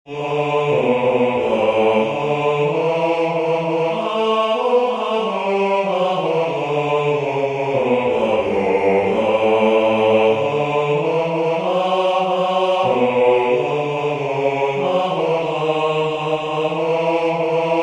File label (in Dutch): 4 stemmen Bass